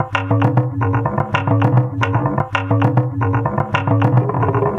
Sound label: Muthi Drums, Acoustic Beats; Sonic Foundry (Sony Creative Software); Myths of Technology